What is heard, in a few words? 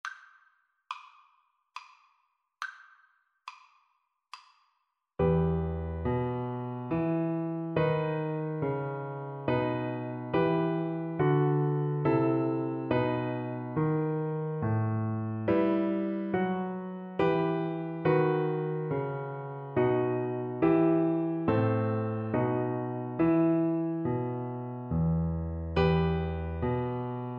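viola karaoke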